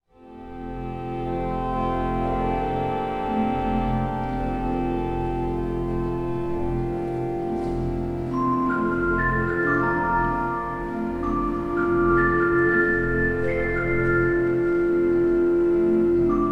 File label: built in Celesta Improvisation; Schiedmayer